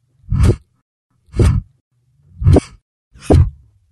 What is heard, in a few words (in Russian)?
udary po vozduhu